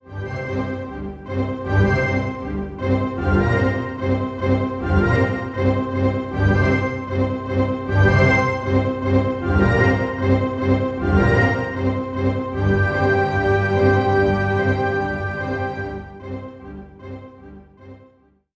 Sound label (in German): Orchester Verlaufsmusik D